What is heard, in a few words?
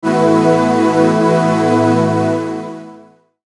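Musical Element, Drum Snare March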